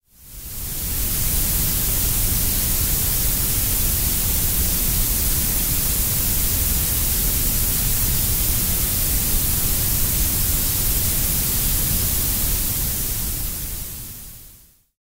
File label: blueberry noise